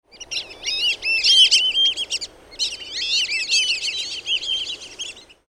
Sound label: Voice Black belly whistling duck